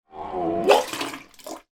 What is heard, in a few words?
human person vomit into toilet